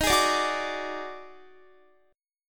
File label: Eb Minor Major 7th Double Flat 5th, Guitar; strummed; x,18,18,19,18,21; 4E,4A,5D,5G,5C,6F